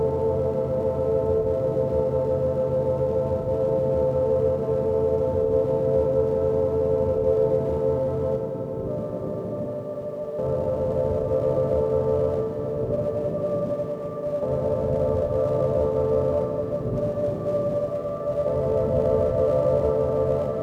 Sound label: drone home; Polyend